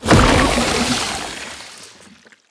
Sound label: glare On big Eye